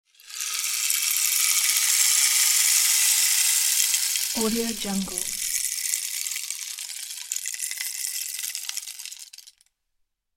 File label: serene rainstick